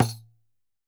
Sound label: Tamborine - A#; percussion; Cymatics - Infinity Beta Pack 2.0; Zeus Percussion Collection